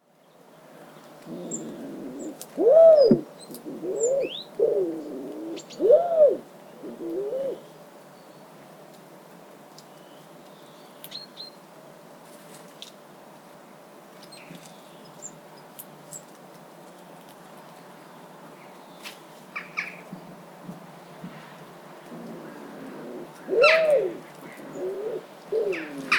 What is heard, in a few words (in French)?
pigeon ramier appel